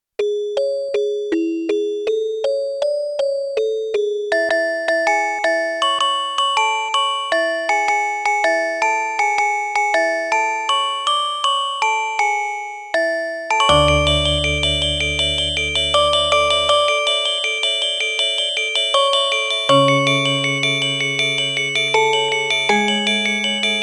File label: bali gamelan gong kebyar; benjaminroberttubb